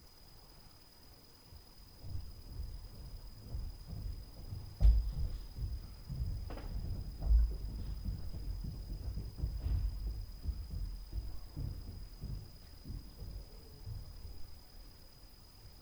STAIRS NOISE; Night Noise

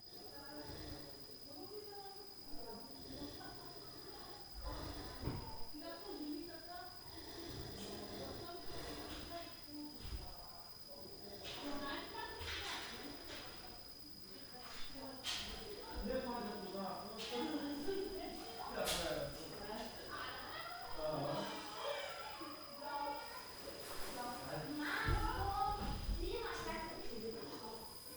SLEEP SPEAKERS DOOR, Night Noise; SLEEP + SPEAKERS + 2x DOOR

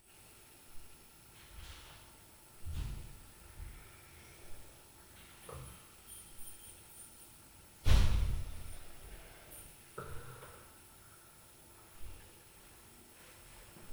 DOOR, Night Noise

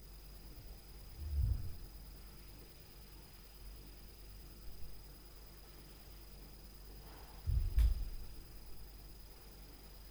UPSTAIRS NOISE; Night Noise

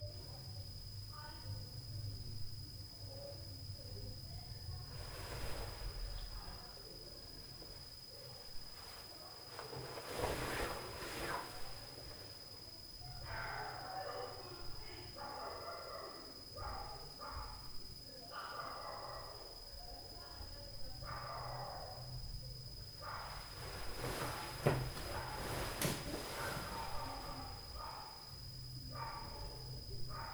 KIDS + DOG NOISE, Night Noise

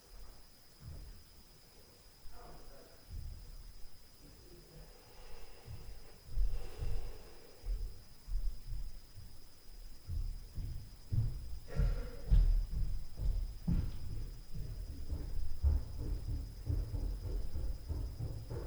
Stairs Noise Speakers Smashing Door Noise; Night Noise

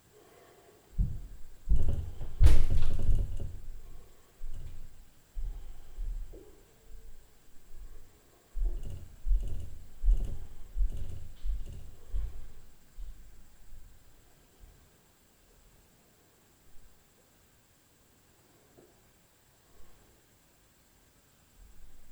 LF UPSTAIR NOISE + TAK TAK, Night Noise

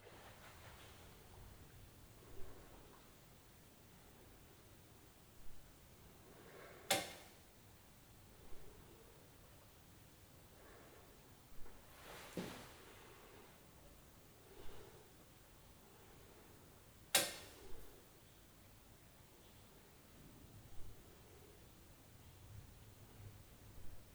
RAIN ON HEATING, Night Noise

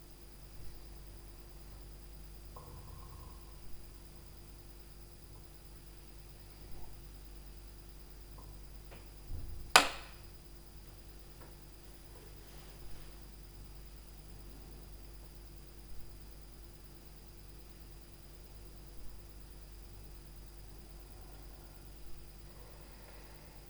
Sleep + SUPER TAC NOISE

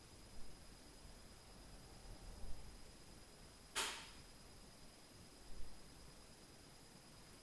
TAK NOISE In Main Room, Night Noise

